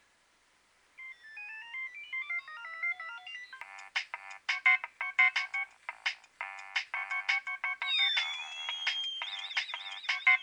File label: nokia3100 ringtone